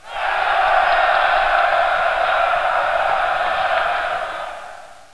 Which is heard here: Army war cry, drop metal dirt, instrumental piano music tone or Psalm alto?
Army war cry